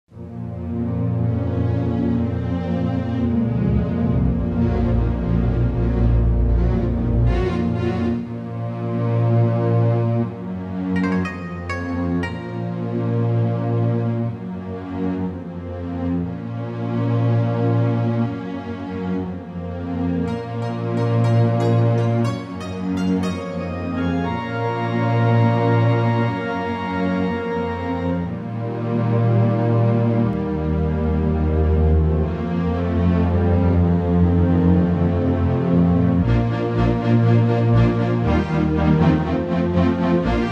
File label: Title Theme Instrumental (Strings Mix); Swords and Sandals 3